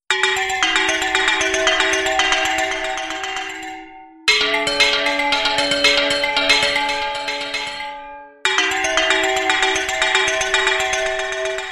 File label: Indonesian gamelan bells ringtone